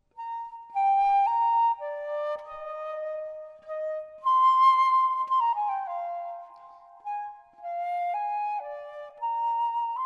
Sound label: andante 440hz flute; schubert